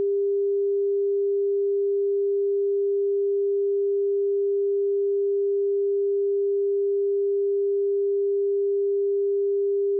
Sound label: sine